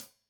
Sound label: Hat Tight, Hi-Hat, Cymbals; Deeply Sampled Drums